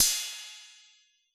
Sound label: 808 kit crash